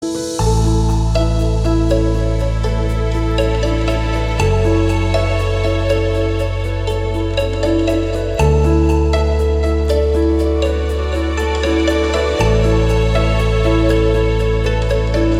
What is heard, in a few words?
ambient piano and strings